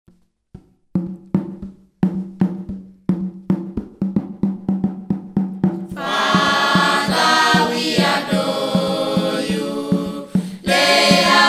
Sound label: Choir; Bethany